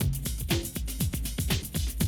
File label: 120, Classic Drum Loops